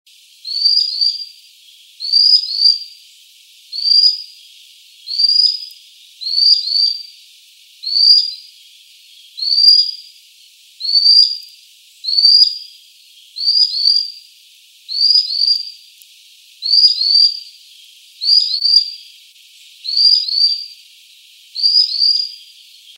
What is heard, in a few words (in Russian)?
perevozchik